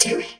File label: SYN Vocodic; Best Service Gigapack 1